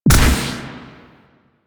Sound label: Pistol Proj Explosion; Weapons; Warframe; Drifter; Operators